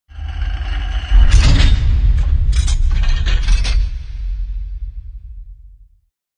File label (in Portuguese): som de cofre abrindo